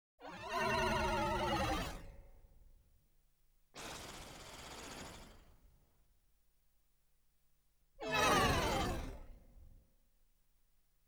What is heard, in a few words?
Legends, Unicorn; BBC Sound Effects Library